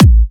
Dubstep Kicks; Vengeance - Essential Clubsounds Vol.5; Bassdrums

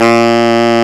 SAX A#1

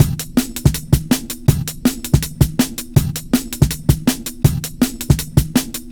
162, Loops, breaks; M8

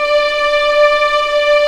D5A LEG, Violins; East West; The Ultimate String Collection

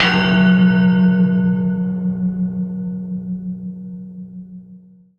prc fs2, MIRAGE Bell + Flute

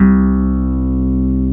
HARP C1; STRNGS; AKAI S-Series CD-ROM Sound Library VOL-8

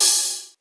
Open Hihat; Drums; Cymbal; House; Best Service; House Definition VOL-1; Cymbal Stuff

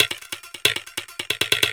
percussion loops, Techno, 138

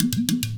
Niger udu; Spectrasonics; Heart of Africa 1

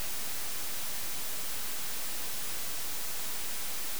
Tape Noise

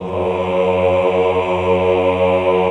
CHO F#1, Aaahh; Sound & Vision - Gigapack I CD 2 (Roland)

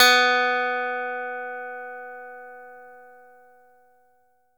E-GITARRE, Alpine Volksmusik; Best Service

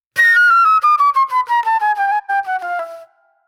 Soul-Jazz Flute, Call And Response

